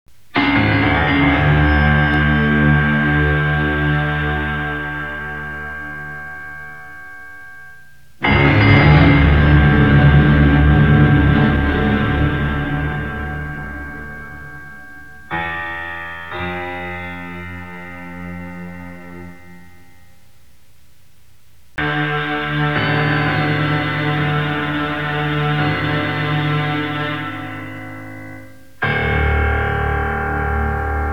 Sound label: piano version